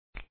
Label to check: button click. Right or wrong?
Right